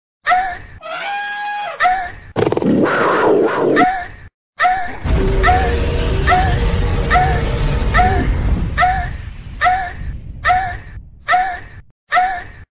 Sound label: Animal Anime Ahh Ahh Ahh Ahh Loop